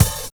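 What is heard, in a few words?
bass drum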